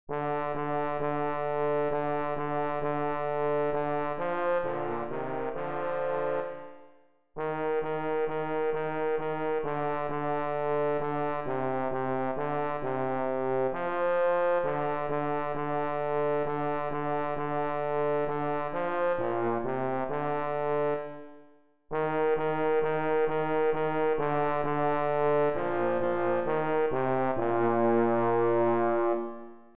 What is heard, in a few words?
Jingle Bells